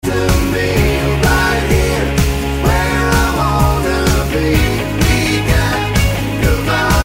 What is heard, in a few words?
B Vox